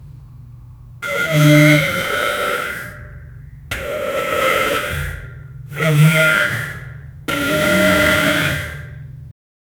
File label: gruff man grunting, effort